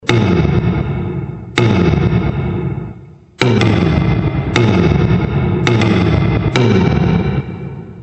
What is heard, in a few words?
cutaway